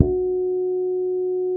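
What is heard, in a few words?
F#4, fretless harmonics, bass; Spectrasonics; Bass Legends CD 1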